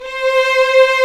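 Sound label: strings, 8pc; String Master Series; Vlns 2-5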